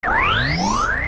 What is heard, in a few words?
Mirror Shield Absorb Start